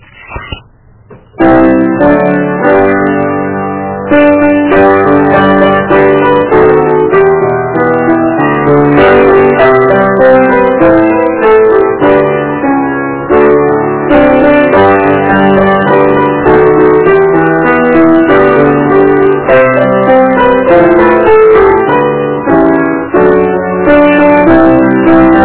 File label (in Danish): akkompagnement; det er paaske